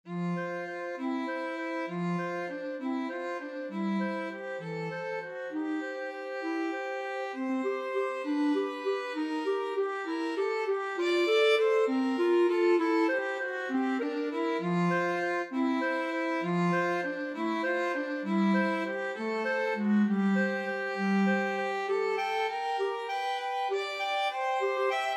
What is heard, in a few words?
waltz, clarinet violin duet